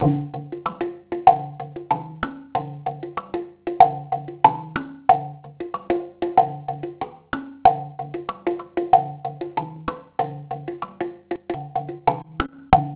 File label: tongdrum